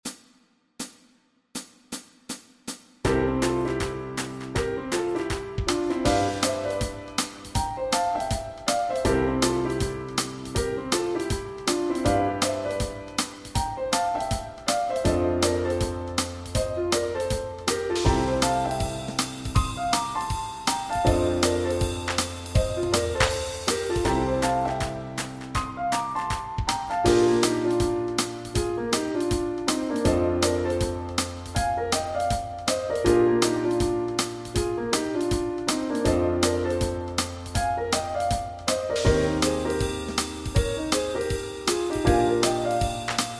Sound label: Backing Track, walking bass